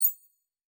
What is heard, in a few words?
Digital Click, Sci-Fi Sounds Interface